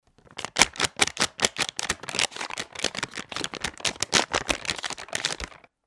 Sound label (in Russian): hrust deneg